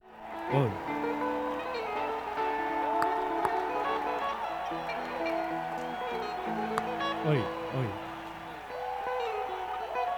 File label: Tuning